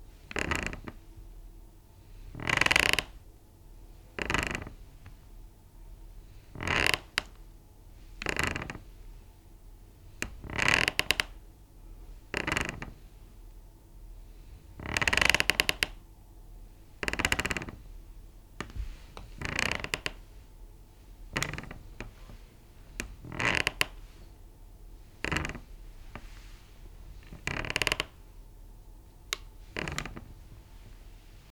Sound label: Chair Squeak